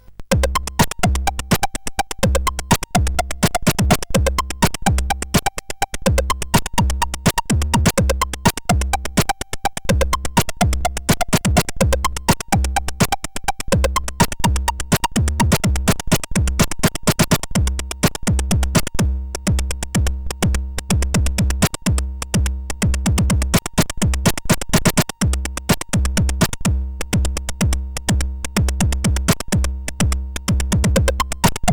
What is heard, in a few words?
Break the Beat, CSG8580R5